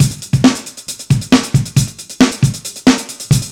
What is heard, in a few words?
136 live break